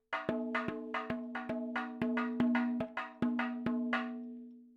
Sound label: 110 7 8 MADALAM FILLS, percussion